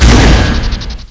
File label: td stun; weapons; cstrike